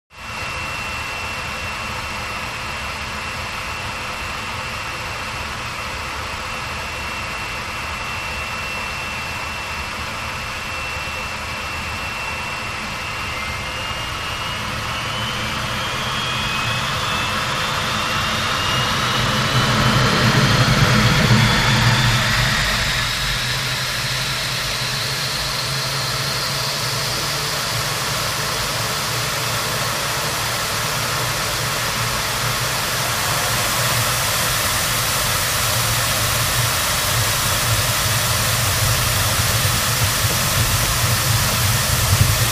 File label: Gnat Takeoff Interio